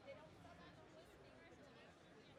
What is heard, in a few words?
VOCAL; NAMM 2018; MyMix Recordings NAMM 2018